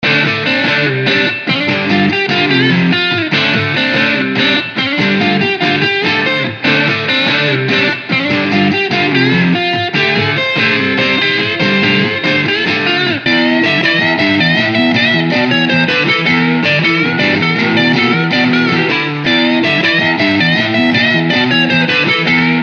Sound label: Rock Guitar Grooves; VGS 2024 christmas bundle